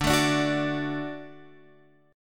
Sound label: D-Major, D, Guitar, Open F; strummed; x,9,9,9,9,9; JGuitar